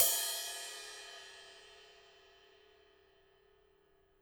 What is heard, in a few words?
ride, jazz